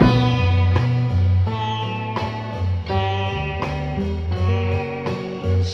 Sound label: guitar, Loops